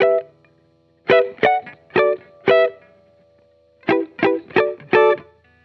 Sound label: Electric Chord Loop 170 BPM E Min; Cymatics